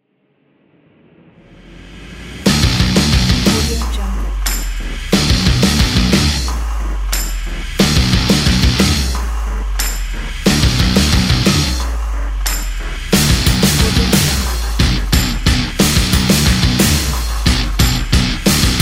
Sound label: powerful commercial metal